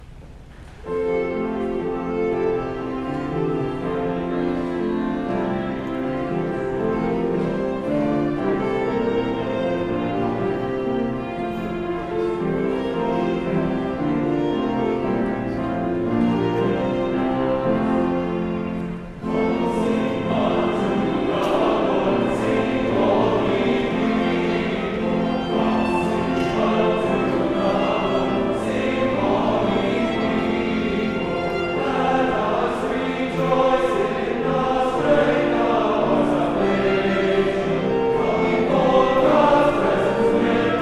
Choral

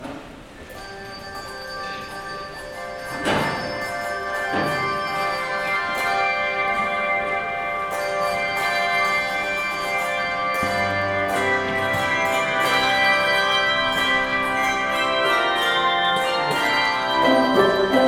Handbells Festive Rondo; Cynthia Dobrinski

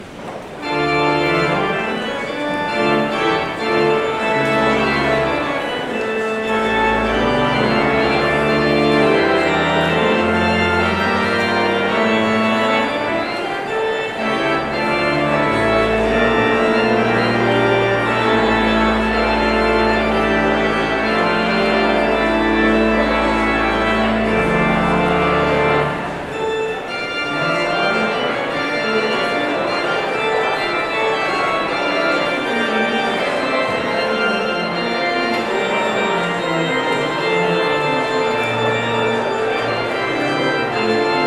Postlude Prelude in D minor; JS Bach

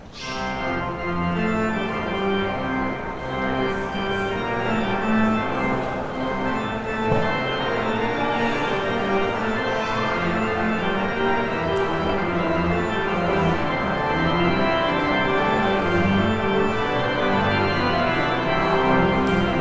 Postlude

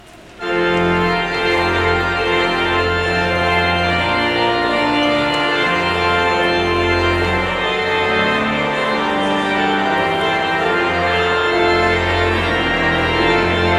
Postlude

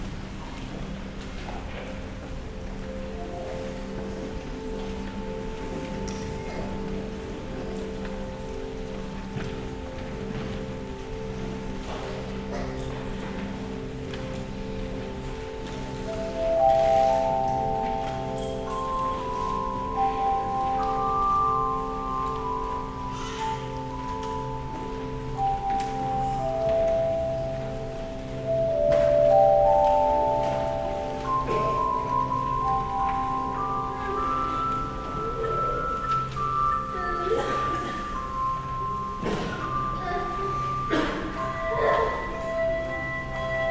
Handbells

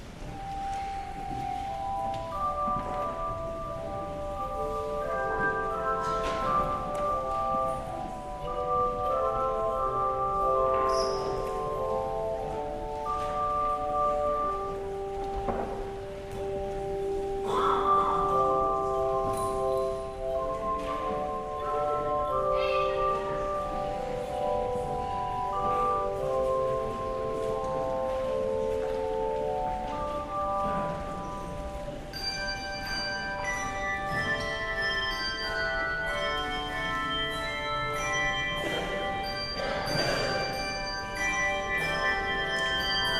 Handbells; God Loves Me Dearly

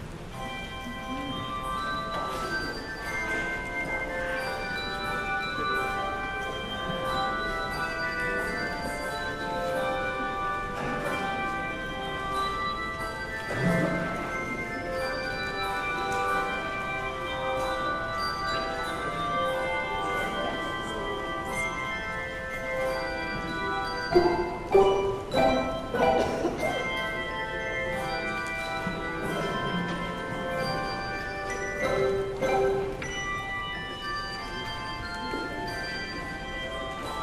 Handbells

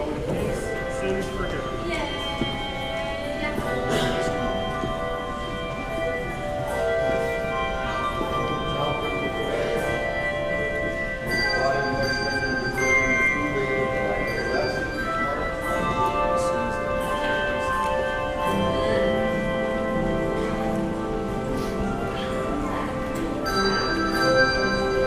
Handbells; It Is Well; Behnke

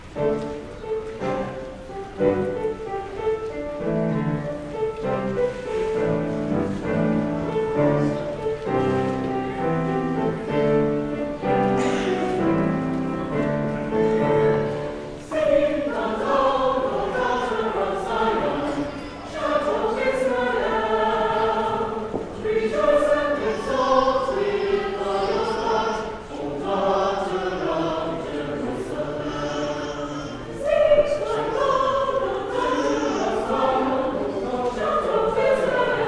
Choral

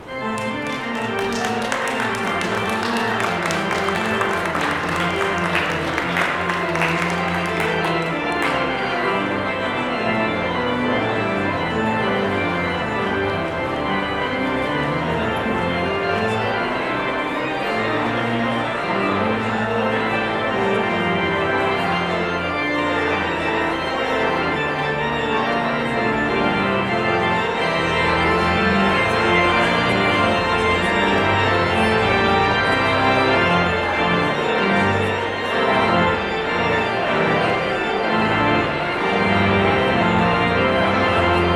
Postlude; Oh That I Had a Thousand Voices

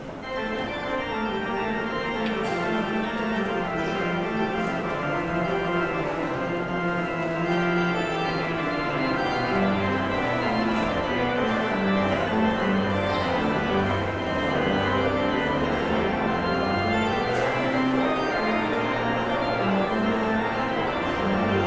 Postlude; Oh That I Had a Thousand Voices

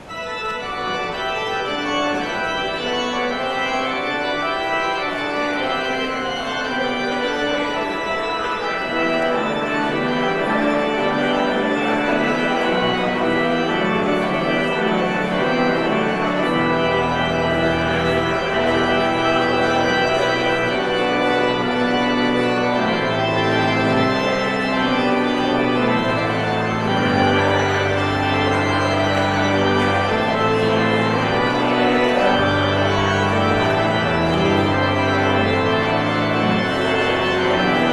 Postlude

